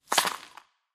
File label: winter tile drop